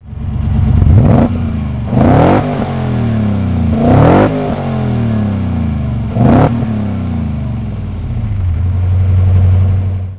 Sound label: Revving; Montreal